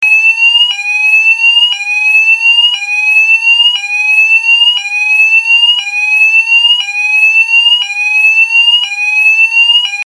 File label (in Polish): ton